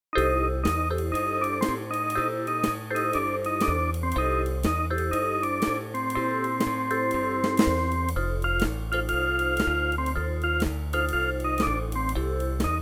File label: MIDI blues